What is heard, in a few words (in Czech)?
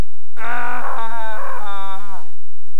rvani v dalce; sic scream